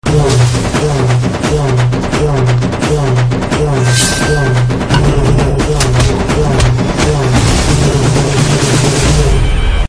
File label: drum beats ringtone; KadaiKutty Singam; Bollywood